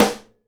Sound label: snare GLASS, Live Snares